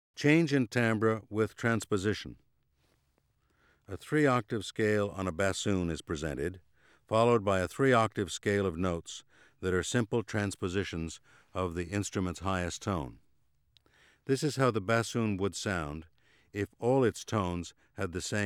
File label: Change in timbre with transposition